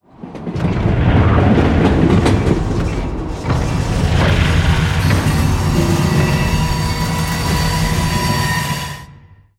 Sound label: slow, train; Half Life Alyx; scripted sequences